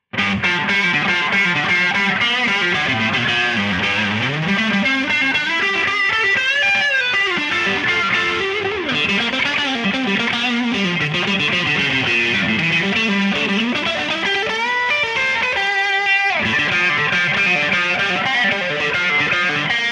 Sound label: Distortion